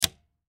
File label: Breaker Switch, Breaker Switch On Flip Throw Trip Fuse Box; Breaker Switch Off Flip Throw Trip Fuse Box; Switches; Household